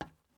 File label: glass step